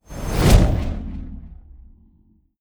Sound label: alteration charge; skyrim